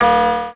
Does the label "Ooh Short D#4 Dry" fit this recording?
No